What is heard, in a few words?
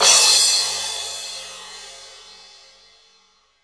FX MIX CYM, FX CYMBAL